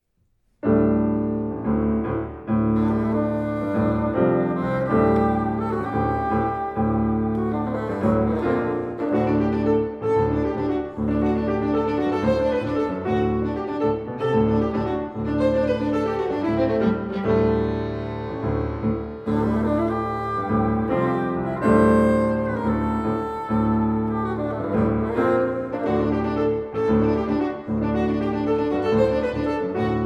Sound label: Flutes Violins Trumpets